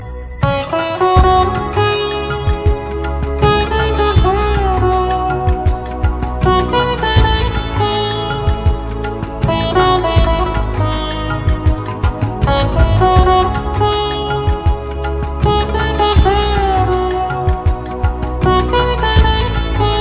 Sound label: Soft Tune